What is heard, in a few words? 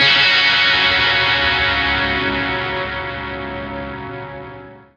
Lake Dirty F sharp; torchlight iii; ps4